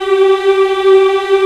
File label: VOX African; Roland LCDP11 Africa VOL-1